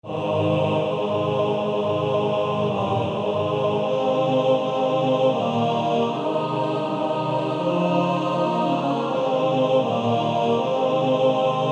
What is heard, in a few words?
Male Choir